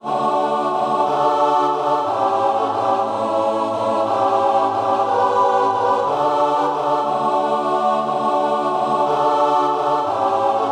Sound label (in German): gemischter Chor vierstimmig